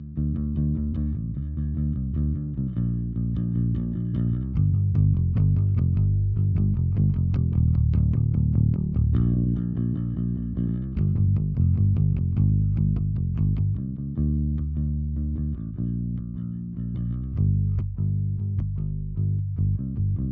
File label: bass raw